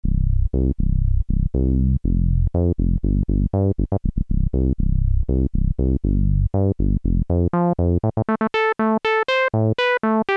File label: yamaha tg500 filter lpf q050